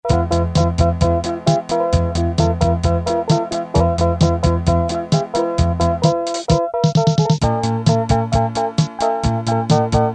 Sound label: rock, Casio MT-500 Rhythms